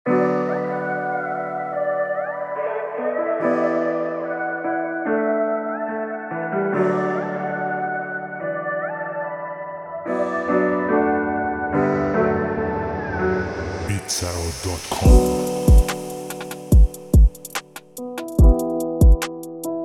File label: Nice trap